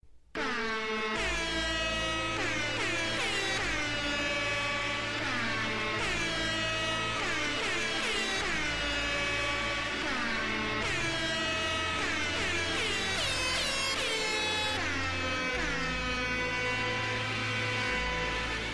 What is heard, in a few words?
honk